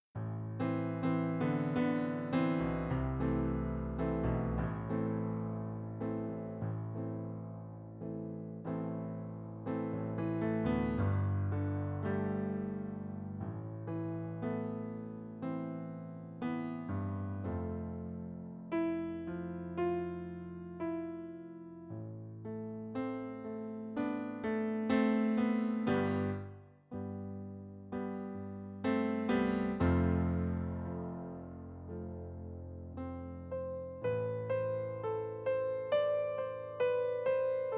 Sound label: Piano